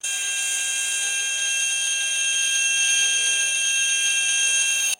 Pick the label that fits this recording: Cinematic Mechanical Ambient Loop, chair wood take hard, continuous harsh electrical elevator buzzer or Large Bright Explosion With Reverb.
continuous harsh electrical elevator buzzer